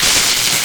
Plasma Ball Hit; MUDevs S20.1-3

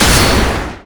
Spin Step Hit; MUDevs S20.1-3

